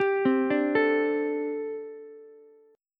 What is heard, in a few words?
G Suspended 2nd Suspended 4th, Ukulele; Gsus2sus4 arpeggiated; JGuitar